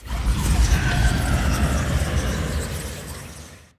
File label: Summon Projectiles; Mage Lord